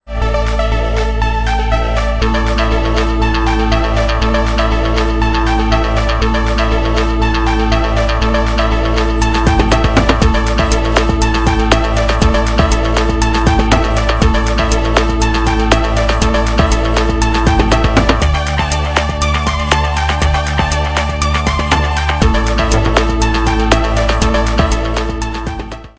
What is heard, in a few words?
Funky Synth; Nokia; 6233